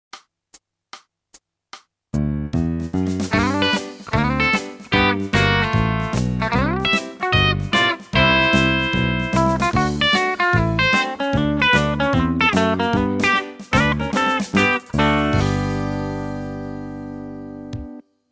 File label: country